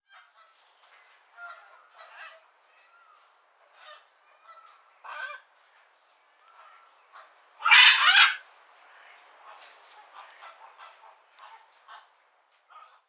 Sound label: parrot sounds long